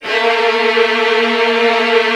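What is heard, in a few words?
Virtuoso Strings, violin trills, A1; ILIO; Kirk Hunter Virtuoso Strings VOL-1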